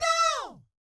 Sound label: DOW, Vocal Jazz FX; Spectrasonics; Vocal Planet; JAZZ COMBO